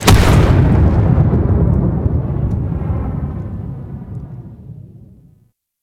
Fortress Cannon Explosion; Ambience; Gameplay; Warframe; Grineer

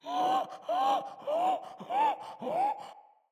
Infested Runner Breathe Quick

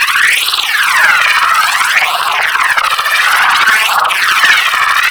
Grineer Speeder Rifle Projectile Loop; Weapons

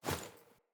Foley Action Default Jump Land, Clothing; Player

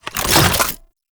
Safe Zone Item Drop; Warframe; Nineteeen Ninety Nine; Deep Archimedia

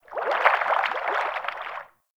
Fish Tank Movement

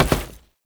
Step Default; Warframe; Oraxia; Foley

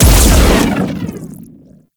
Overhead Long Smash Attack Impact; Enemies; Warframe; Grineer Nightwatch

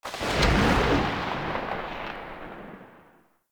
Alt Fire Tail, Weapons; Warframe; Corpus; Tenet Quanta

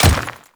Royal Guard Dirt Step, footsteps; Enemies; Warframe; Grineer Royal Guard

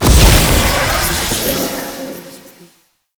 Cave Combat Shadow Despawn; Warframe; Duviri Murmur; Cave Combat Encounter

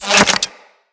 Melee Pet Projectile Return; Sentinel

